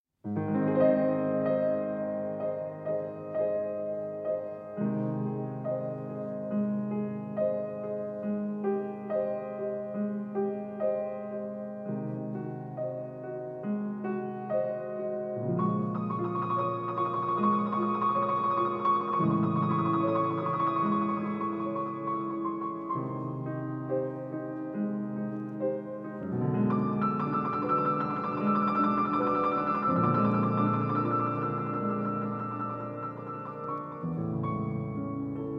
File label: Adagio; Spartacus; of Spartacus and Phrygia; Arr. For Violin &